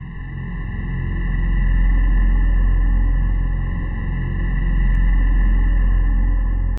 bgm game machine

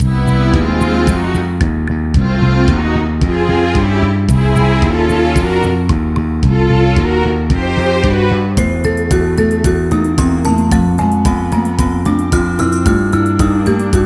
BGM; Heroland

